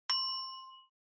Onoma Ding Short

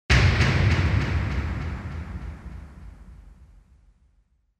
Impact High Delay; Onoma

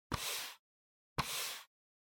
PC Mouse Move Soft Pad Hit

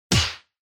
Hit Punch Dry

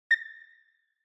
Retro Anime System Low

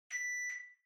Washer Front Load Beep Far Mid

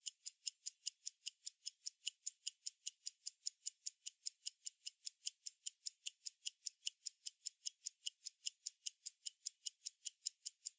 Pocket Watch Tick Table; 懐中時計01-02(チクタク 机)

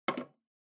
Stopwatch Digital Put

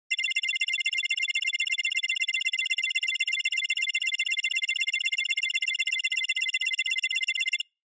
Cyber

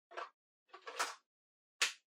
Portable MD Far Battery Insert

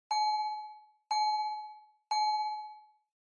Fire Truck Siren Fake Bell

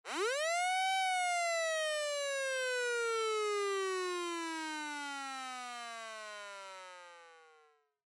Police Car Siren Dry

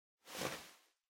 Packaging Air Cushion Far Rustle; 梱包用エアークッション; 遠い ガサガサ; 梱包材

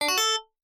Sys Set pop up

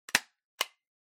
Stapler Staple

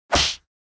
Hit Slap, Dry

